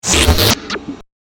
Mechanical Monster Slurp, robot sound effect

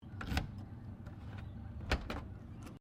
Car Holden FE Boot Key Insert Unlock; Holden Fe 1957; Classic Australian cars; Cars (Sound Effects:Vehicles)

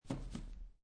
Playing Cards Tap Deck Of Cards On Felt; Casinos

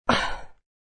Fem Exhle, An effeminate sounding exhale; Exhaling; Voice

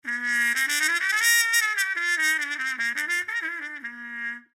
Trumpet Mute Scale, Trumpet Mute Scale Jazz Lick; Brass; Blastwave

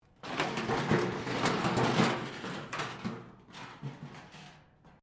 metal rubbish bin move concrete; Materials

